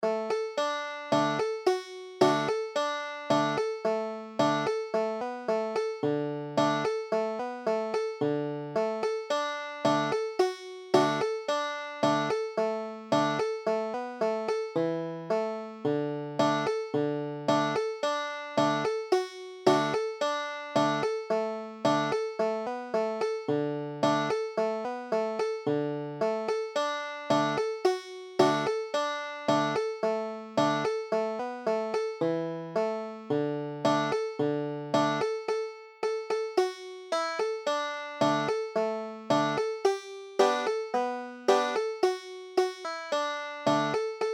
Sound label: banjo